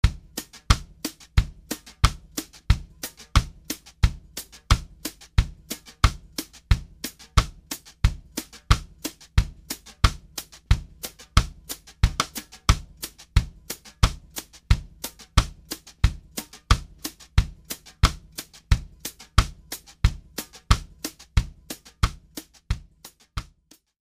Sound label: Brush example